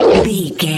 troll monster hurt pain short

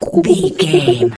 voice funny cartoon misc

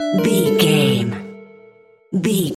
jingle chime negative

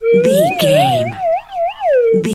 whistle slide wobble notes long

